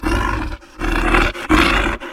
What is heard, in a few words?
crawler idle; shadows